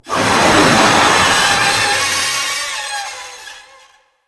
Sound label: Infested Ancient Death; Characters